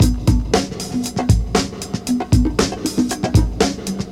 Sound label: Classic Breakbeats Middle Break Original 2 Bar